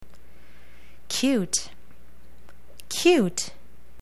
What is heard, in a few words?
cute